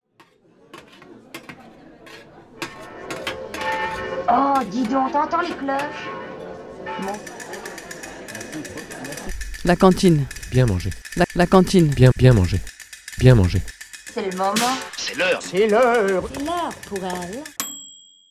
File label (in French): JINGLE REPAS; crefad